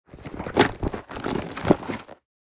Leather purse searching and rummaging; foley

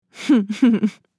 Female chuckles

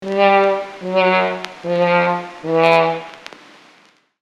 Trombone fail riff on vinyl

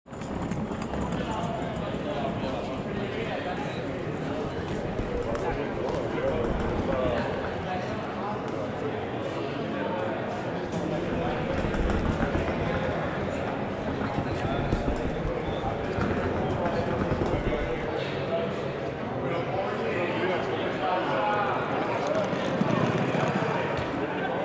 Airport gate and cafeteria with people and walla

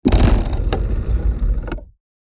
Operational industrial gears squeaking

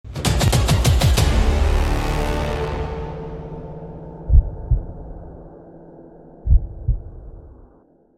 Epic cinematic build swell riser

